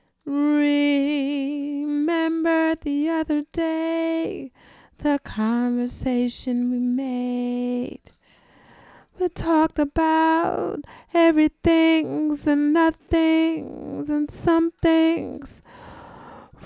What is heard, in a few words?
diva